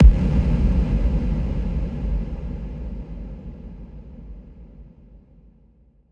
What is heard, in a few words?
electric explosion; Crash Bang Boom